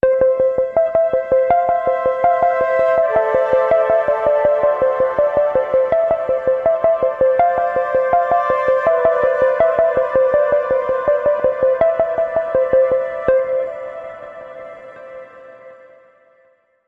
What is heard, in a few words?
Sting